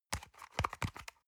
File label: paper box small handle medium